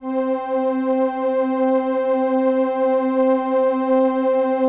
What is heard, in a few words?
LAMBDA, String Machines